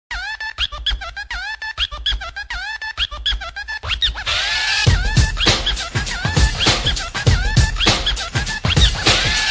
msg tone ringtone

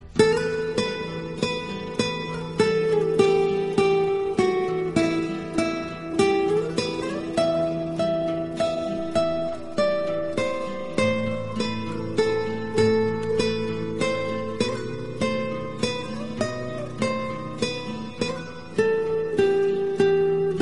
romantic guitar ringtone

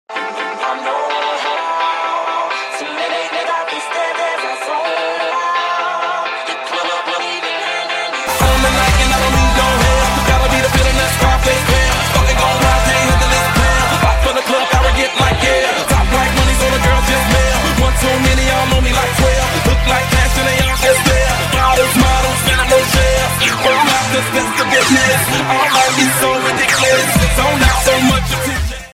ringtone

